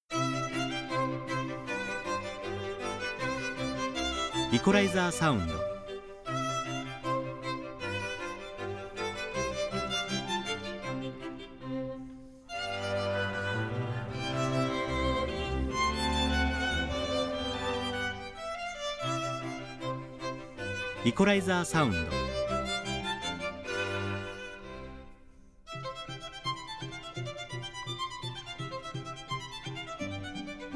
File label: string